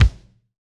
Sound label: St Kick; Roland BK-5